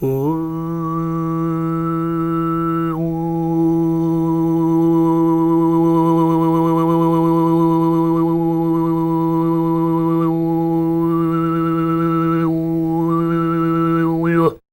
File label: FREE, TUVAN MID